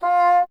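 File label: wind bassoon F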